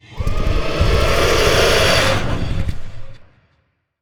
Vocal Idle; Warframe; Zariman; Void Angel

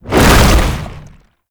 Infested Swipe Whoosh; Enemies; Abilities; Lasrian Tank; Warframe

